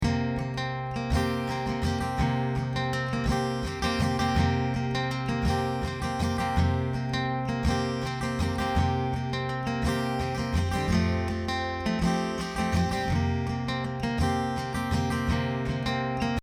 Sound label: guitar